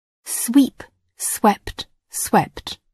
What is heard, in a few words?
sweep